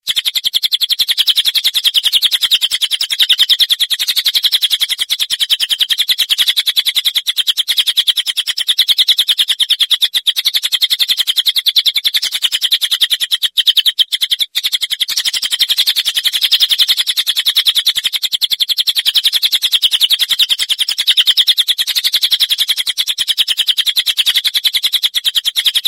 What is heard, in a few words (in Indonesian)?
masteran dahsyat cililin